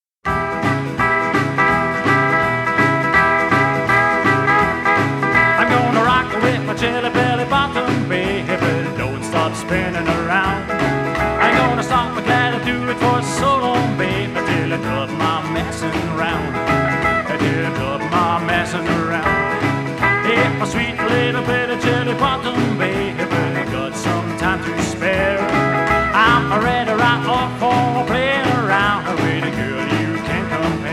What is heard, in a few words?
rock jive